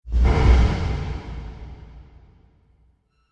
Cinematic Dark Hits; Sound Design; Hit Sound Effects

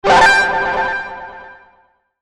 Logo Distorted Guitar Trill Delay; Sonic Logo Sound Effects; Production Element Imaging Element Accent Transition

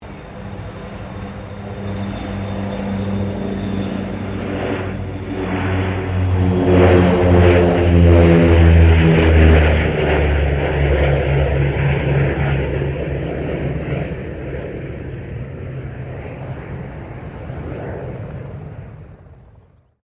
AIRCRAFT P-2 NEPTUNE PASS; RAN P-2 Neptune; Airplanes (Sound Effects:Vehicles)

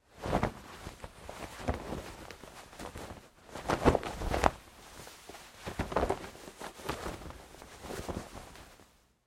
Foley Fight Moves Jeans Struggling; Wrestling and Struggling; Big Room Sound

